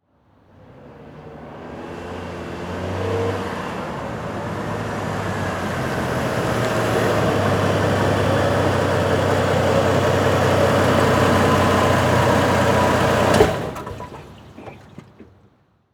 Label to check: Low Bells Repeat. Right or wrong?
Wrong